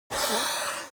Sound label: creature yeti hiss weak